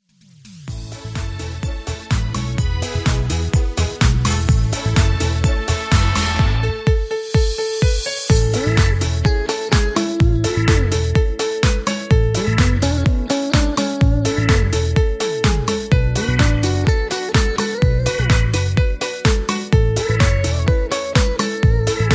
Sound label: Patter